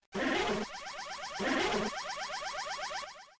Alert HUD

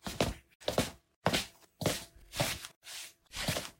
skip walk

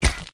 Baboon Stab

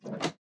Pull Lever

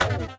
Ghost Device Turn Off

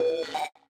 signal translator begin

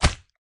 Meaty Hit

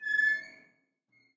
ship ambiance light squeak; Lethal Company

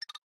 Button

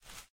Rustle; Lethal Company

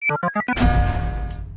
Hangar Door Opening

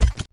Step

